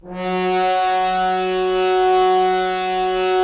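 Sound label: Solo French Horn, brass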